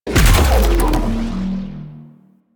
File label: Arachnoid Camper Shield Down; Warframe; Corpus; Abilities